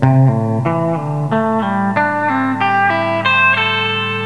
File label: pull, guitar